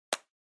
Felt Tip Pen Open

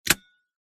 Padlock lock

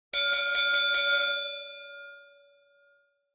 Boxing Bell

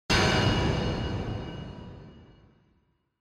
Impact Dry; Onoma

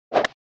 SNES Golf Tee Shot

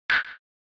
SNES Baseball Wood Bat

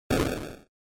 NES RPG Door

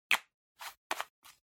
Liquid Glue Close

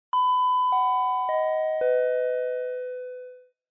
Chime Announce

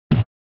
SNES Fighting Land

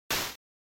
NES General Noise Single

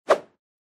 Motion Swish

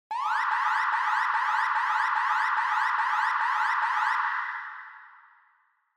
Warning Siren, Reverb High Long

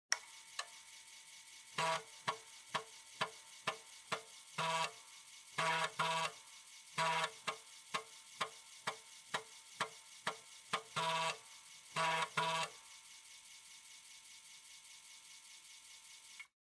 Floppy Disk Drive Operating Noise

